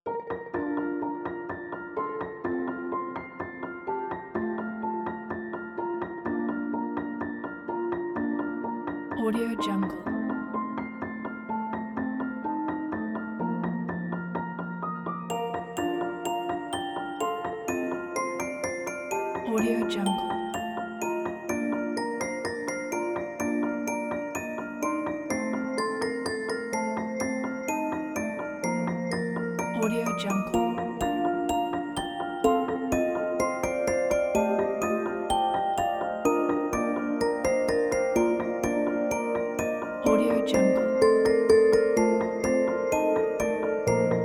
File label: christmas bells